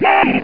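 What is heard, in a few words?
ice slide; spectrew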